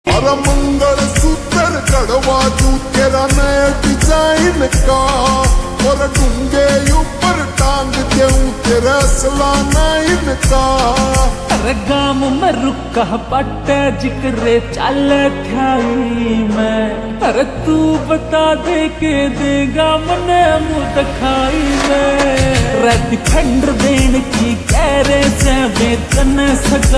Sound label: Ringtone Slowed Reverb